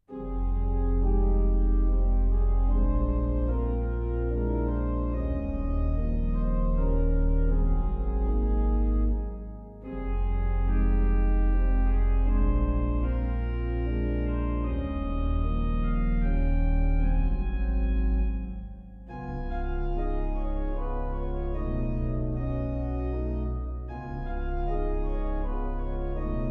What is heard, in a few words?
Improvisation, organ